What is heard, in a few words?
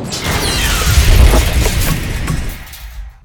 escape, ships